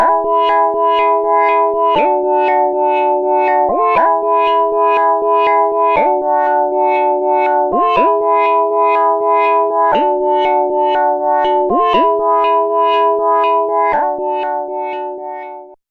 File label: Shruti